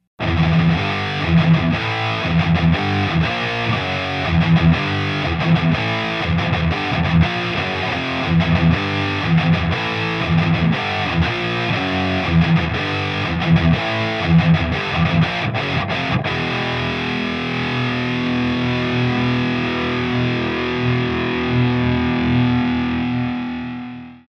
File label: vh4 channel 4 original comp